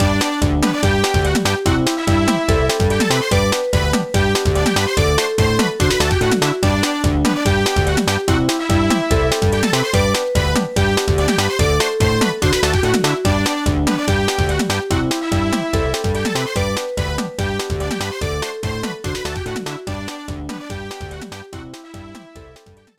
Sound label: Unknown Fanfare; Dig Dug Digging Strike; Nintendo DS